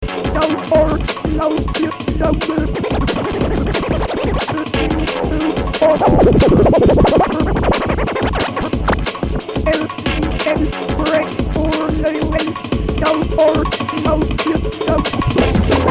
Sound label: scratch